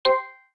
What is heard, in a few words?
Video Record, ui